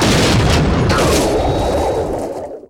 Explo Underwater; Destruction

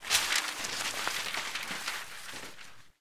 Paper Throw Pile; SFX Kit; Foley - Misc

